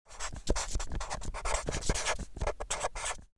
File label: Permanent marker writing paper surface short